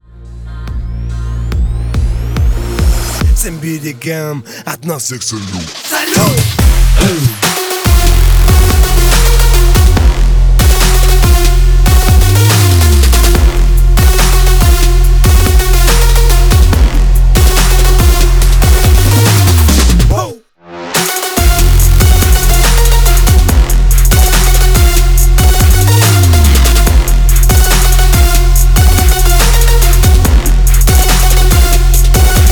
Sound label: EDM Trap Big Room